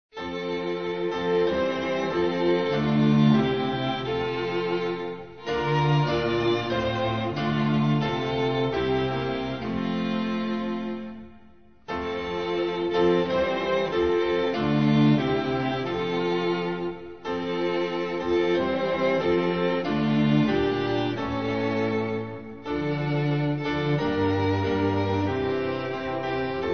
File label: instrumental; hymns portuguese